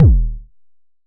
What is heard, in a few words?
909 - D#1, Kicks; Mbase 11 Kicks